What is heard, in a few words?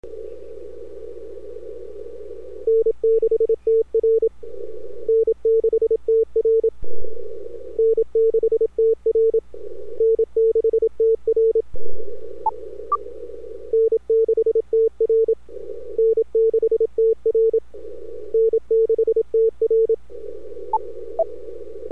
PTT thump